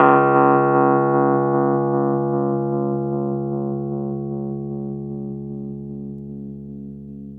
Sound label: Rhodes 73 D#, 3-D Audio Collection; E-MU Producer Series Vol. 5 – 3-D Audio Collection; 3DRhodes